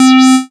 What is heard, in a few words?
synth c, Techno Synths